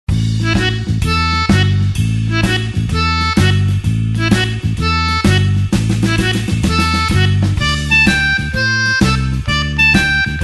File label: blues harp shuffle A